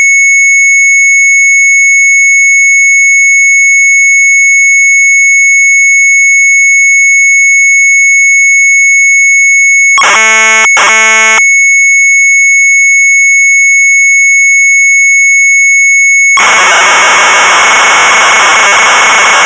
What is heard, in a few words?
MZ800 Tape, sharp; HEX DEC; retromelsarchive